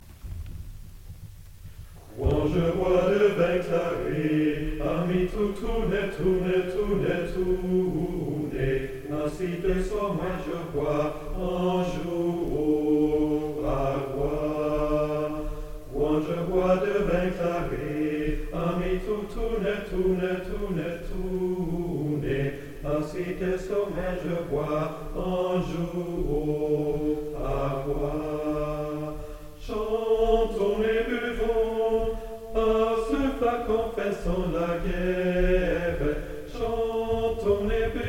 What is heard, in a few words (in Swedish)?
soprano samtliga eko